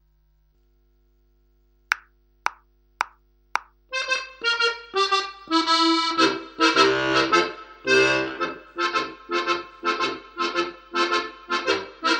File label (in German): Tarantella (2. Stimme)